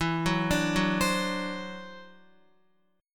E 7th Suspended 2nd Sharp 5th E down Guitar 4F 4A 5C 5G 5C 6E; arpeggiated